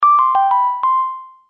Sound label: Samsung Message Tone